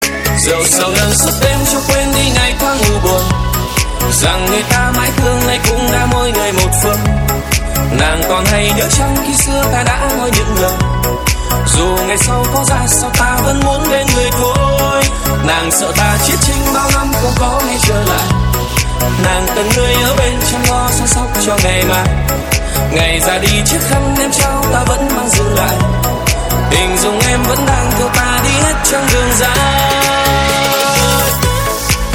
Nhac Chuong Remix

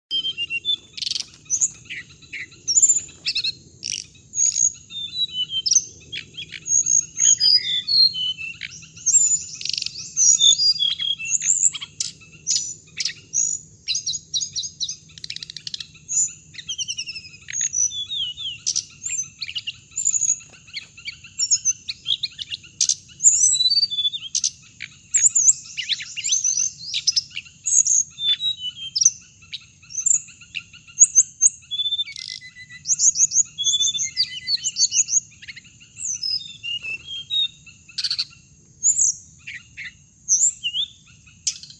Tieng chim Hong Hanh

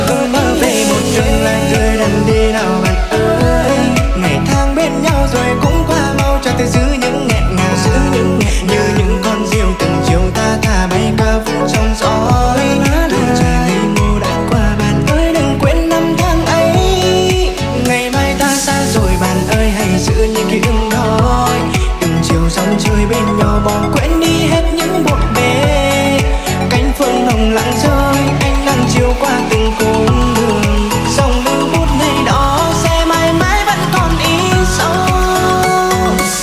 Nhac Chuong Remix

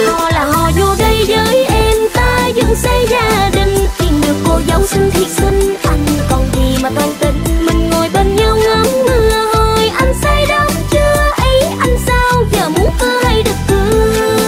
Nhac Chuong

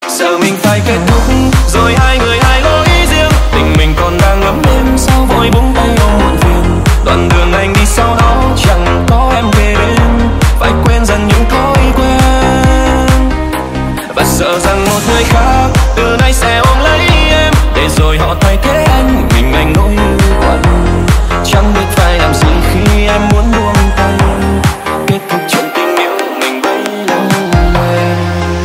Remix; nhac remix

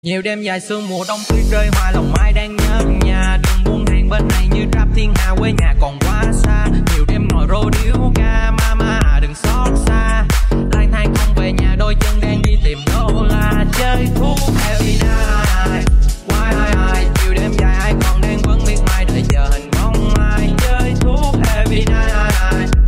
Nhac Chuong Remix

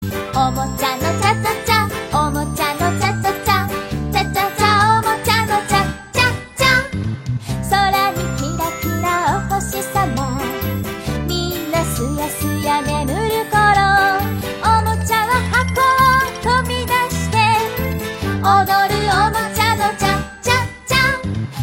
nhac chuong cha cha cha

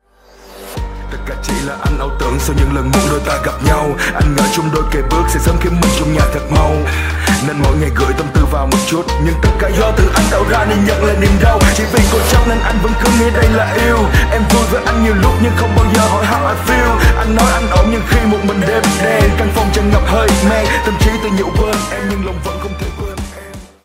nhac chuong rap

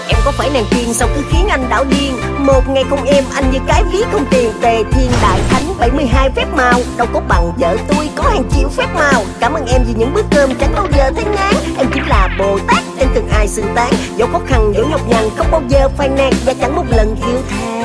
Nhac Chuong Rap; nhac tre

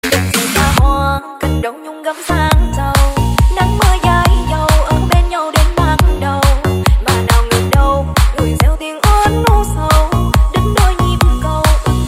Remix

